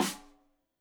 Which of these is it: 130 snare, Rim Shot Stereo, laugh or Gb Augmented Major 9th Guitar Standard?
Rim Shot Stereo